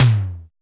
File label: FAT E TOM; Drums In Your FACE!; R+B KITS